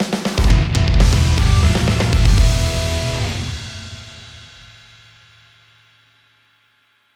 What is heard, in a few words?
Arena Win